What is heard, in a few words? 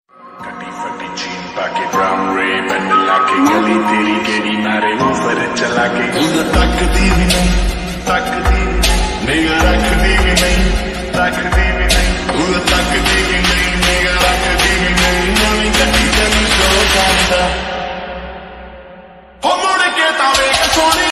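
lofi punjabi ringtone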